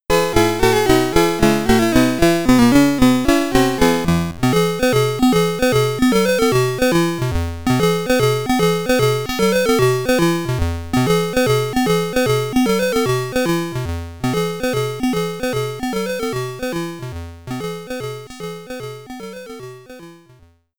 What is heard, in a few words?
Get Ready! SID Stereo; Super Pipeline Commodore 64